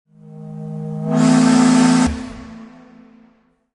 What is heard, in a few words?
Long Blast